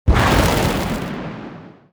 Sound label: Explode Into Bats; gamerip; Grimm